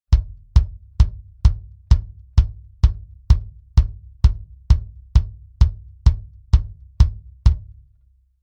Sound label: BD Mic Test MD441 Mix